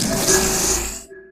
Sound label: player exit stick paint